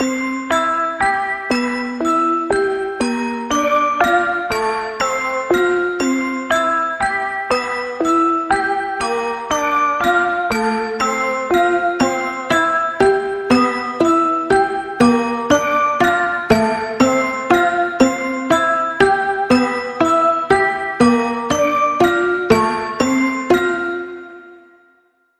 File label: Strange Holiday Pulse